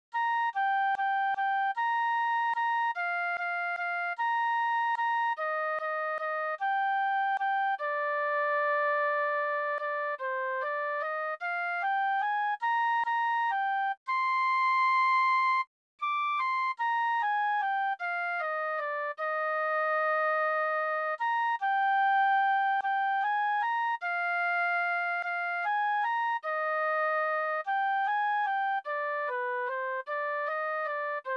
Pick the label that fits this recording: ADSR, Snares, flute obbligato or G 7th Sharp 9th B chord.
flute obbligato